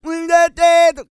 SING, World Voices, CARIBBEAN

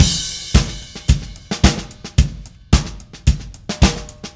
Drums Crash, Indie Rock; Session 01 - Brighton; Sonic Foundry (Sony Creative Software); Indie Rock Anthems

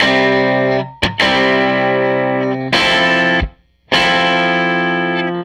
Tele Guitar Loop

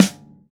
WD Snare, Roland BK-5; Snares

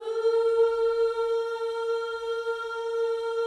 OOS A#4, GOSPEL; Spectrasonics; Vocal Planet

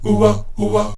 O-WA G#2; Spectrasonics; Heart of Africa 2

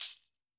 TAMBOURIN

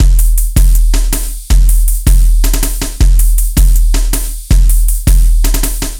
160, Drum Loops; Total Drum&Bass

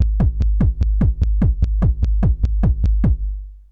Lo-Fi, Essential beats

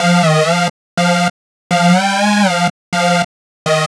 Synth, Trip-Hop Loops; Sonic Foundry (Sony Creative Software); Rondo Brothers Trip-Hop Loops & Samples

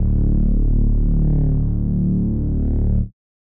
Reese D; 99Sounds; Monosounds; Music Loops; Instrument Oneshots

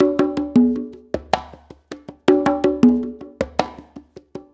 Blues Perc Loop; Drum Tools

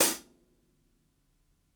ROOMY HH, HI HATS; Basement drums vol 2

